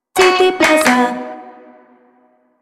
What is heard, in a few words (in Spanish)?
audiologo; cityplaza